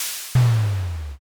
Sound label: hydraulic